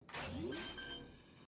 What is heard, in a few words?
Spike Plant; Valorant; Soundpad